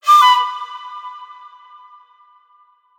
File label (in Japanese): ピブッ